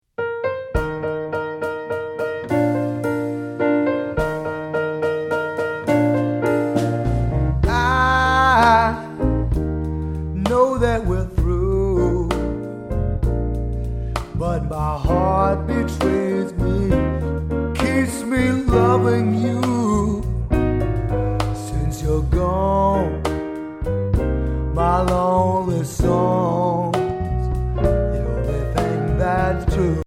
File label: Heartbreak Blues, piano